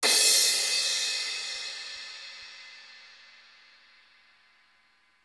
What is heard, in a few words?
137 garage crash; Dance DJ; Pro Tools Essential Demos; Digidesign; Essential Loop Demos; Demo Session - Dance DJ